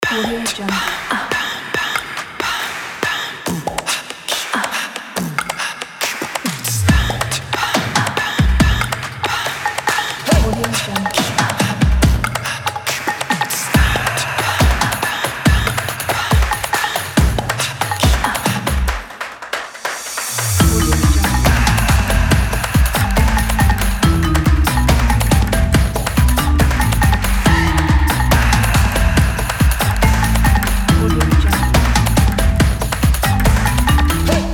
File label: advertising world news